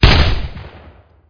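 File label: Assault Rifle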